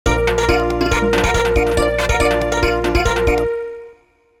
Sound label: Samba